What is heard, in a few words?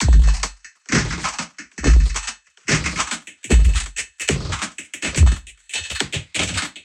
Loop, 70 bpm, Circuitbend; 99Sounds; Mangling Audio; Loops